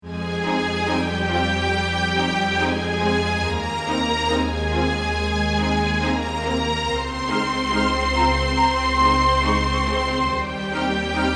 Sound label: beat